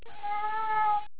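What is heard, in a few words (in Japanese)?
negoto, cat sleep talking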